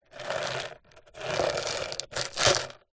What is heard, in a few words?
foley plastic pot containing small debris tip movement; zapsplat